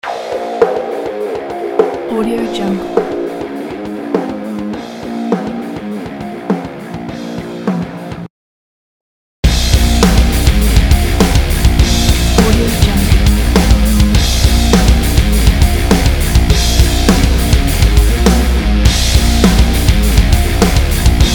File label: epic metal